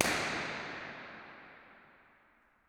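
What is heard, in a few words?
Church Center; Reverb; 1500 Seat; 50ft; FL Studio 9; Fruity; Edison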